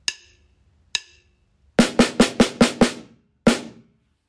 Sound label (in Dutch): Geluidsfragment Slagwerk Ongestemd